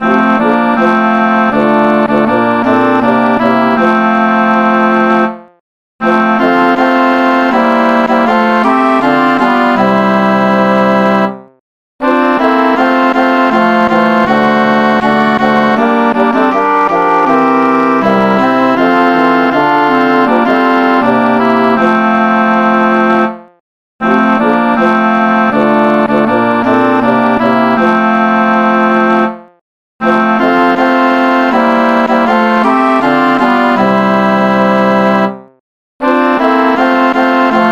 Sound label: Tutti; let us break bread